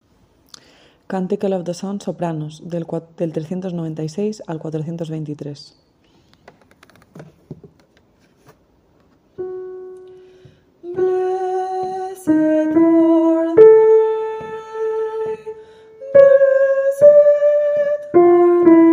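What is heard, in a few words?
soprano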